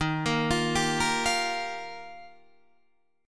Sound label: Eb Major Add 9th, Guitar, Open D